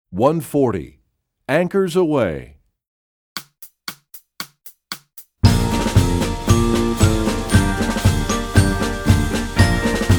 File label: Percussion; Anchors Aweigh; EE2000 Book2